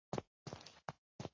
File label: Hold scrotch fidgets loop